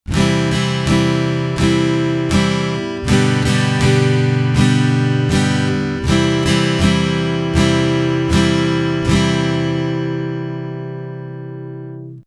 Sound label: easy strum, acoustic guitar